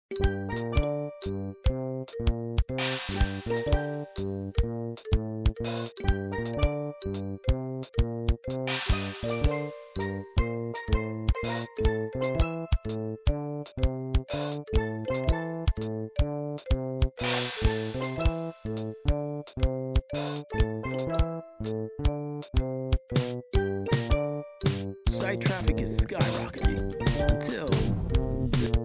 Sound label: caribean steel drums; Midi Pack 2